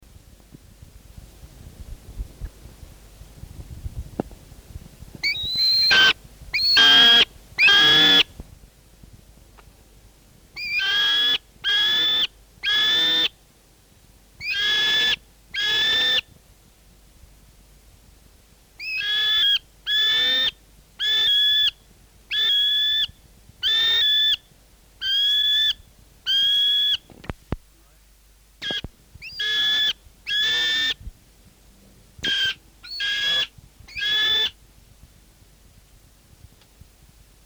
nz falcon song; conservation, native animals, birds, bird song

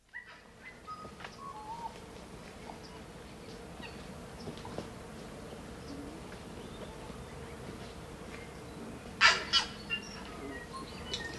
tui morning chorus, bird song; conservation, native animals, birds

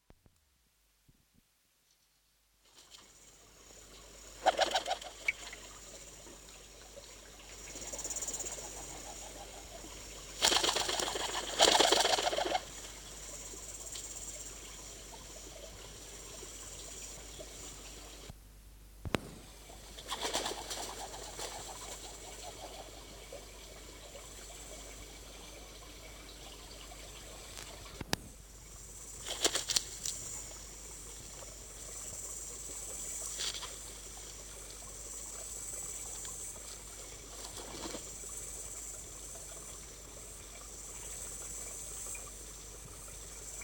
nz pigeon song; conservation, native animals, birds, bird song

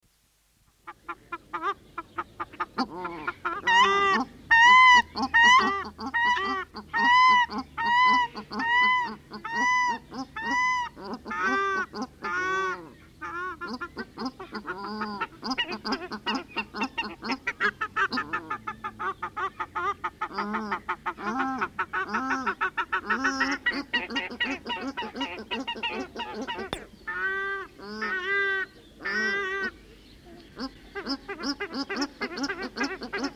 paradise duck song; conservation, native animals, birds

